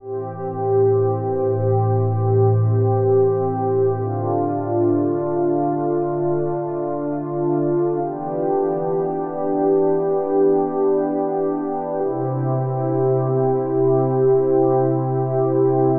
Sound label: 120, Am, INSTRUMENT LOOP, CHORAL